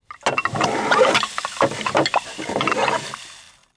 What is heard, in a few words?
brickworks